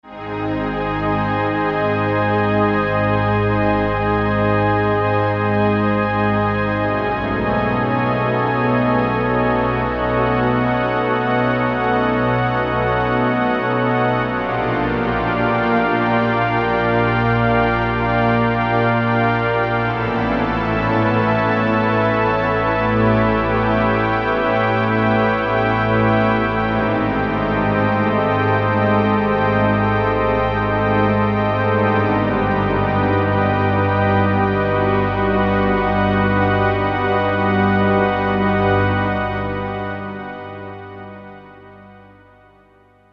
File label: pad; pad - Spiritu; E-mu Proteus FX